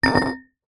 foley bottle glass milk empty set down on concrete ground; zapsplat